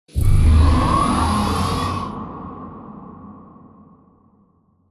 Giant Floor Panel Exit On Track Start

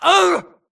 grunt death quick; Titanfall 2

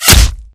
bullet light ballistic flesh; Titanfall 2

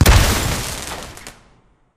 Explo Damage State Heavy; AI Reaper; Titanfall 2

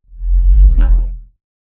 Movement Jog; Titanfall 2

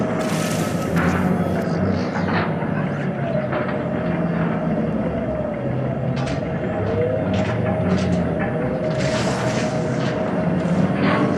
Robot Arm Track Slow Mid; Boomtown; Titanfall 2

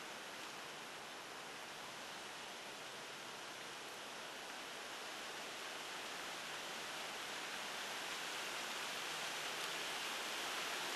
Tree Breeze; Blackwater; Titanfall 2

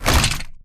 Damaged Torso scrape Concrete; AI Stalker; Titanfall 2

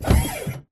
Sentry Turret Body Servo Start; Boost Card; Titanfall 2

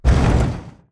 bullet explosive titan shudder; Titanfall 2

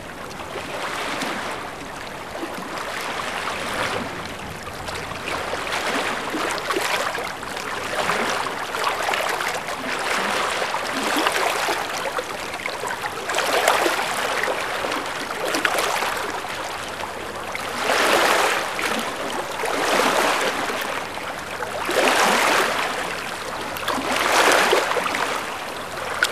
amb lagoon water lap; Titanfall 2

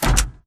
Mvmt FS Walk Default; AI Stalker; Titanfall 2

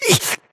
grunt hits; Titanfall 2

